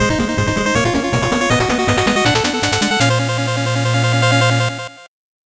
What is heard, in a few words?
8bit jingle slow